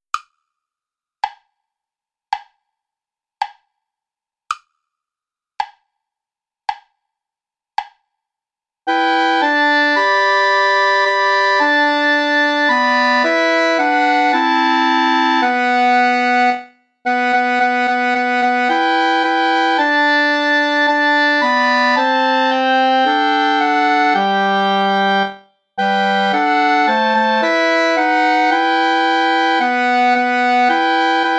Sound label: play along without clarinet; schutz heinrich aller augen warten auf dich herre